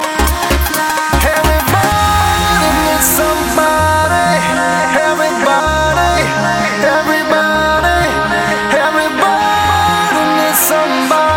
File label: Clubbing Hit